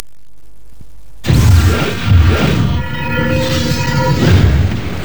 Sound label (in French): ouverture de l iris